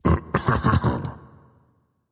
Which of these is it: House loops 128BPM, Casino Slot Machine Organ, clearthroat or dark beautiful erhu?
clearthroat